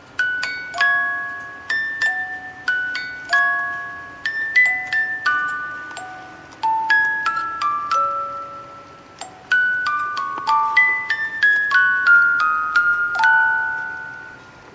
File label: lilium melodie; elfen lied